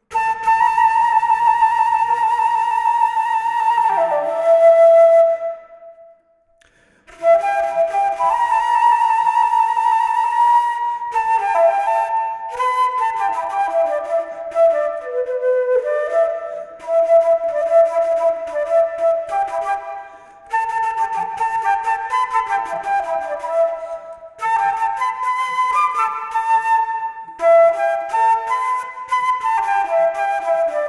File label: Rolling Blues, Flute